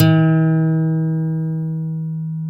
GTR 6-STR, Steel String; Roland LCDP02 Guitar and Bass

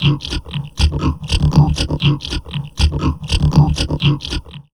VOCOD, Drum Loops Crazy Processed

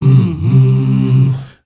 MALE HUM, M. HUMMERS

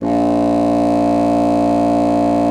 BASSOON A#1; Xsample VOL-6; C BASSOON

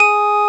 organ G#, M1 organ; Best Service ProSamples vol.10; House; AKAI

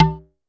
Tabla; Yamaha DTXpress; Effect 1

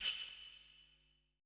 SHELLS; BPC PERC

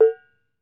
BONANG, Bronze Gamelan; E-MU Formula 4000 Series Vol. 4; Earth Tones

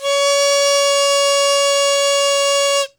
World Winds, Old World Instruments

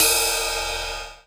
Ride Soft, linndrum; classic machines

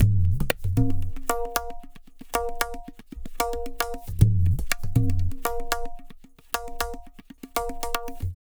Tabla, 115 BPM; Zero-G; Pure Tabla

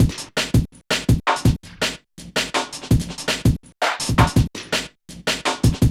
GROOV, Drumz, Grooved; E-MU Producer Series Vol. 6 – Analogia Project

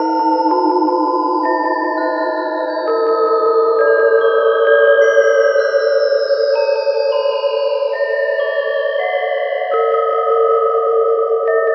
C wholetone twinkles, spacey; Zero-G Ambiosis

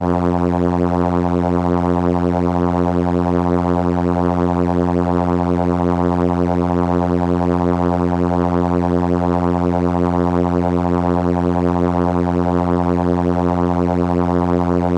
Viola F3, ARP Solina

